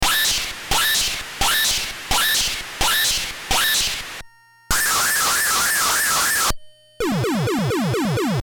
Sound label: Lasers; Star Wars